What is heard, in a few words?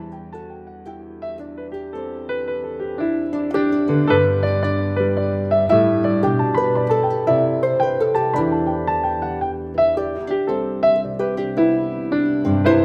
Jig; High on the Hill Full Moon Jig